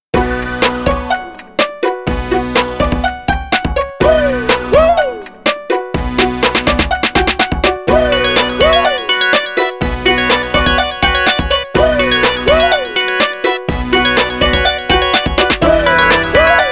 Hip Hop, ringtones; Explay A400

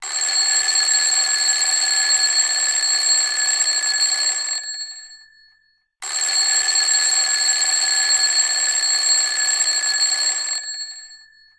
Schedule Vintage Clock; LG Velvet

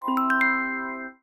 Dingding, notifications; Elephone U Pro

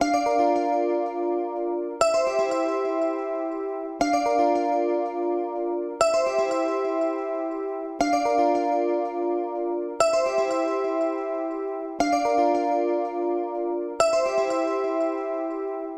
Dreamy Guitar; Explay Neo; ringtones

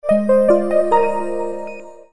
notification; Gionee; GN206

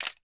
camera click; Huawei Honor 4A

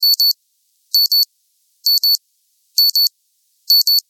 Alarm Clock; Lenovo; Yoga-Tablet-10-B8000

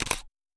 camera click

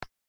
Pretouch Release, ui; FCNT Rakuraku F53 E

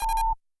notifications, miss call; RED Hydrogen One

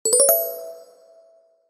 Charging Started, ui; Gigaset GS290

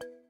Video Record, ui; Elephone U Pro

